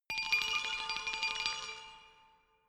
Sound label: glockenspiel mysterious tremolo low short; percussion